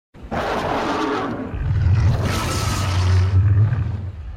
roar small; fatalis

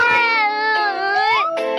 bottle crying

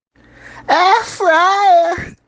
airfryer

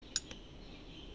psp key tone

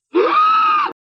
scream

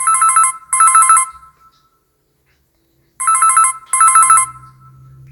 phone alcatel

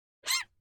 meow

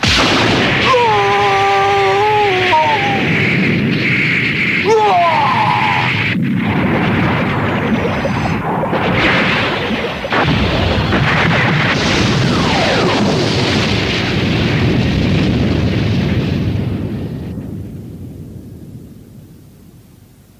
explosion

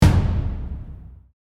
chest bump; ryu

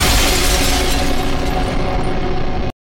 snap ka boom

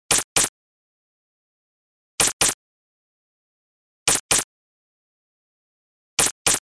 zombie snare

